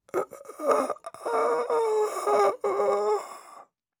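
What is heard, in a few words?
zvuki smerti, death sounds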